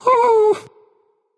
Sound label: nv thinking; smite; voicepack; ganesha